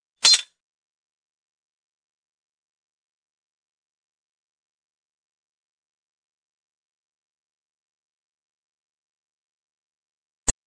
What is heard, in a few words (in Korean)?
Fights, 싸움-전투; 헐리웃특수효과음